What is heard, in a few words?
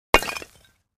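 Cinder Block Hit Sledge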